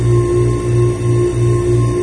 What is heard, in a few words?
amb warehouse power lp, ambient industrial; video games